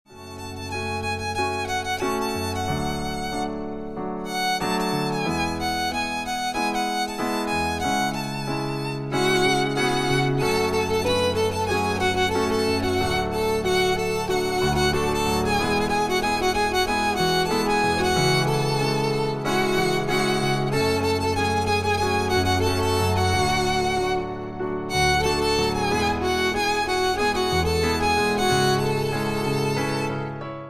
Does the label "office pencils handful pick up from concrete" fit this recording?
No